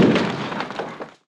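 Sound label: inside detonation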